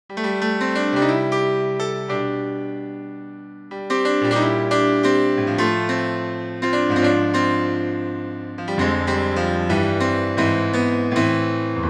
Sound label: Triton Bright